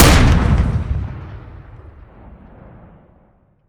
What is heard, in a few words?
Weapons Cannon 73mm Gun; DCS